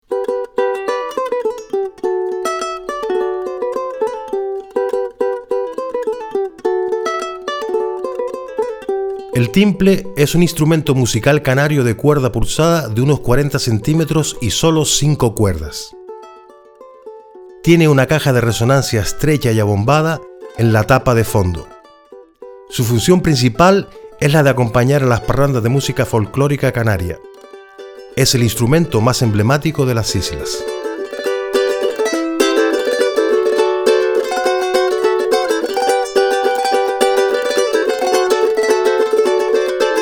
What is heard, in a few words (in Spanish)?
Timple